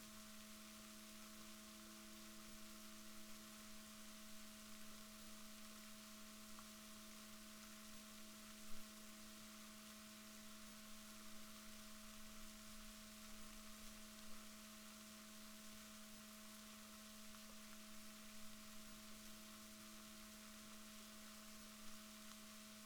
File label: rorquals positif, Balaenoptera Physalus Chile